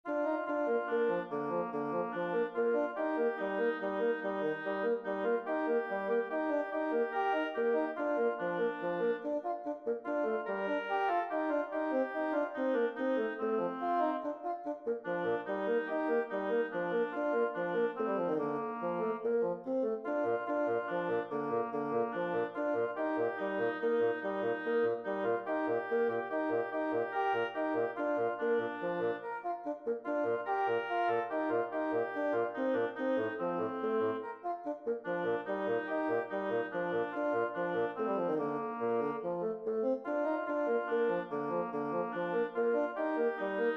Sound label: Bb Maj Oboe Bassoon; duets; Ridderen og Jomfruen